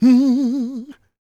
MMM, Vocal Blues Soul; Spectrasonics; Planet

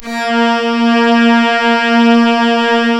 Synclav FM STRINGS A#; Big Fish Audio

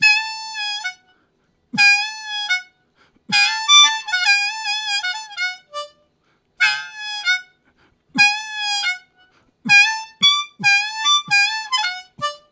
High Swing, Harmonica; Zero G

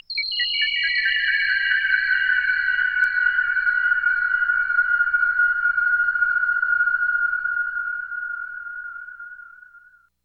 JUNGLE, SYNTH FX; AMG; Global Trance Mission VOL-2

